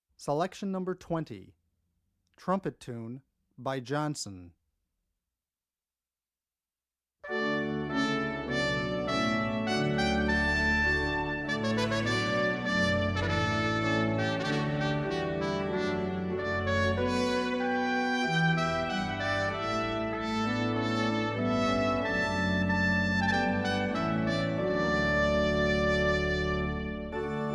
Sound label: Trumpet Tune; Johnson; philipfeo